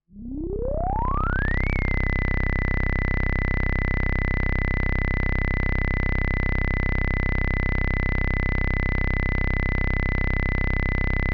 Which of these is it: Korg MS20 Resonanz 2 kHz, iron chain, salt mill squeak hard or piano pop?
Korg MS20 Resonanz 2 kHz